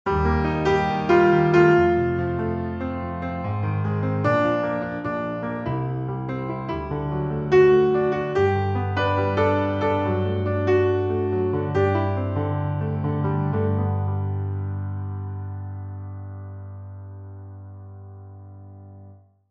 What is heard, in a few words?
Steinway B, piano